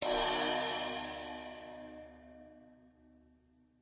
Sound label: Metalist Crash, DRUMS, REAL LIVE KITS; Osama Qureshi DrumKit